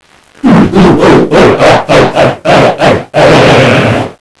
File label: haha